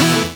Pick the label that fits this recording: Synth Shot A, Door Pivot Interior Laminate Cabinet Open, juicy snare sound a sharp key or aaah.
Synth Shot A